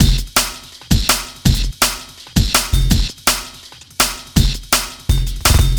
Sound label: Beat, Futurist Drum & Bass; Drums & Percussion